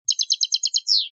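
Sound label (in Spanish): chacheada, canario; campero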